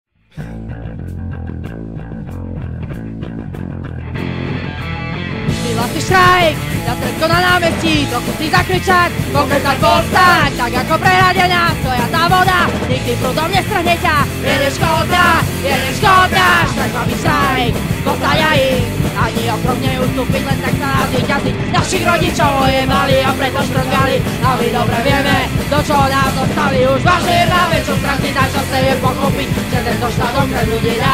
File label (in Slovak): HLAS